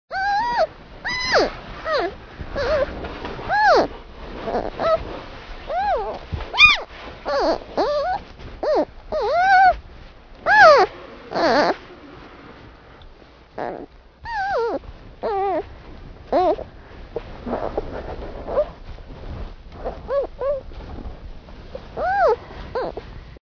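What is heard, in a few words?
panda golos, panda voice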